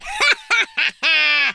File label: laugh, Scotsman; speech